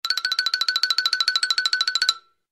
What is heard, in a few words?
Cartoon walking